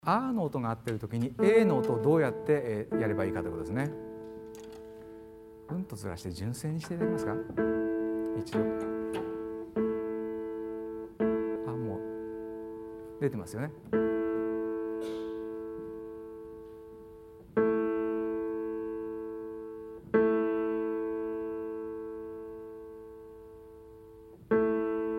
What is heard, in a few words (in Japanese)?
うなり5度